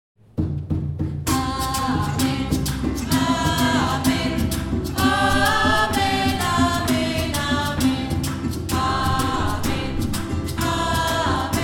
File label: amen